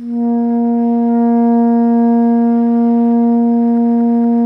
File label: SOPRANO A#2, Saxophones; PP; Greytsounds; Philip Wolfe David Crozier's Saxophones